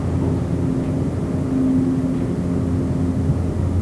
elevator loop; de parking

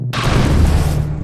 throw

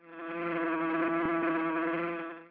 bee; tabernacle

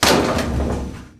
door; mystic radar

